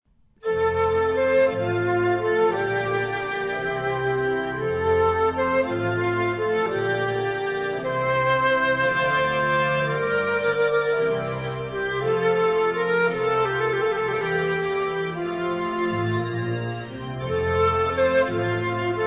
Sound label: Air from Water Music; Haendel; repertorio para bodas